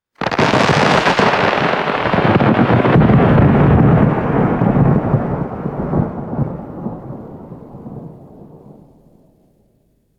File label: close thunder crack and rolling rumble